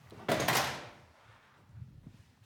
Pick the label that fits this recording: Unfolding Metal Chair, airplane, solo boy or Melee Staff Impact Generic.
Unfolding Metal Chair